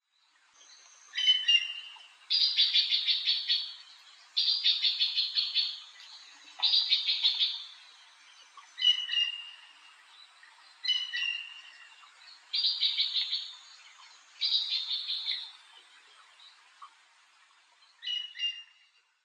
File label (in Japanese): sanjaku, kochi